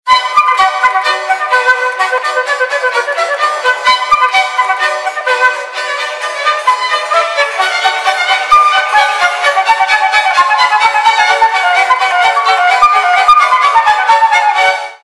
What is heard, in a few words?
Classical; ZTE Z222-AT&T